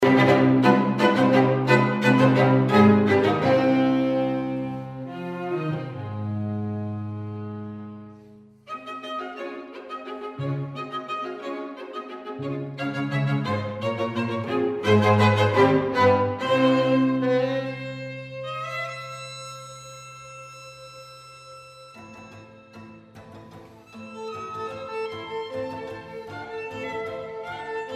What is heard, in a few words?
waltz; kiel